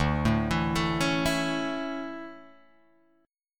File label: D Major 7th Suspended 2nd, Guitar, Open G